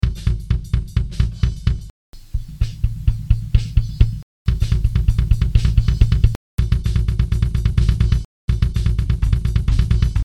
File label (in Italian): sequenza; groove agent one